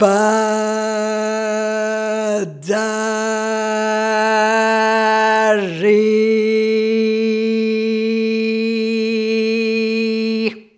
Rhythmic A

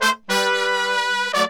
100, BRASS SAMBA

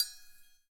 CYM, Ethno Techno; ILIO - Ethno Techno

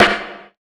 snare (hip hop); Sonic Foundry (Sony Creative Software); Ugly Remnants VOL-2; Beatstuff

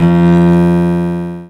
bass A#, CONTRA BASS; Best Service ProSamples vol.55 - Retro Sampler; AKAI

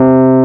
EP, Hip Hop; E-MU Formula 4000 Series Vol. 1; Hip Hop Nation

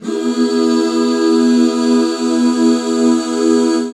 HAL E, BACK CHORDS, Roland; Sound & Vision - Gigapack II CD 1

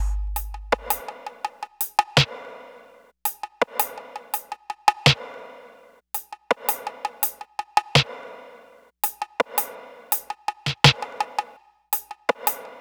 drums B, Smooth RnB, Fmin

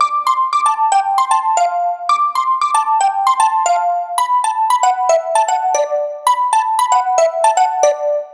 115 G Seq; Black Tea; Zero-G - Future Wave

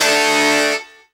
synth, Roland, dance synth; Sound & Vision - Gigapack I CD 2

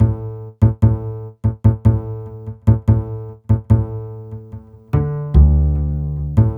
Upright Bass; Sonic Foundry (Sony Creative Software); Cinematic Funk Urban Tone Poems; Session 05 - The Triad

